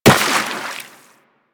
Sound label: Run Water, Footsteps; Warframe; Operator